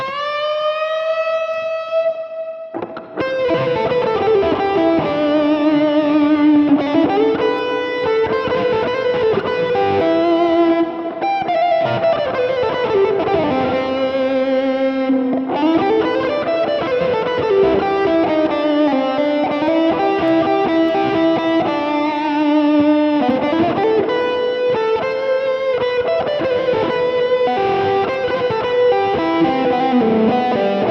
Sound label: stems Wet